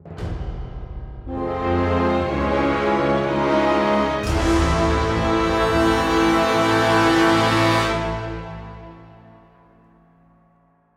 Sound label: Game Over Stinger